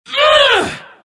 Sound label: grunt; Legion Commander